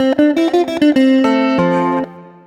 Ac 12 Str Gtr 106bpm F end, Twelve String Guitar, African Guitars; Quazibeat; African Secret